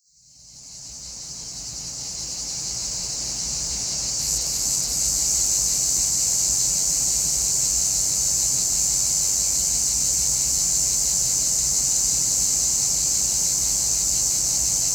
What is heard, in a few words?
Sounds from a Rnewood Cicadas; Nature Sounds; Nature Chill Out 2014